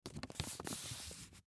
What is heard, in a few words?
Envelope Close; Paper; Office; Blastwave